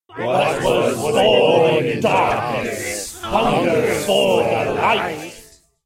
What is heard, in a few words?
cult chant; WFRP Night of Blood 4e FVTT